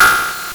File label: LSDj, Nintendo Gameboy; 300 Drum Machines